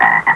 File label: Ribbit; Macworld (1998-10); Games World; Shareware Games; Arcade; Antibody 1.0.0